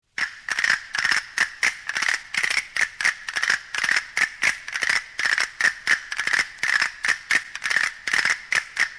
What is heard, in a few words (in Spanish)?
ginebra